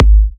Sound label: G#, kicks; markus hakala kicks